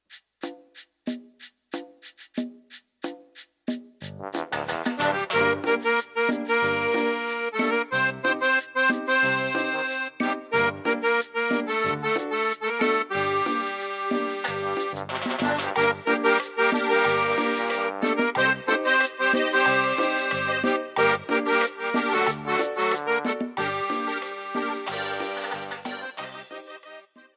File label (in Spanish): Cumbia